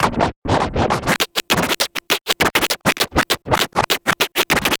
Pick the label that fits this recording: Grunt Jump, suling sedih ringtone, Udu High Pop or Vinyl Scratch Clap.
Vinyl Scratch Clap